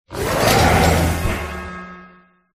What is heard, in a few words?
crowned, cry; pokerogue